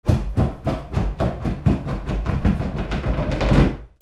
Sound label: FALLING